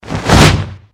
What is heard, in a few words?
deathclaw miss; slender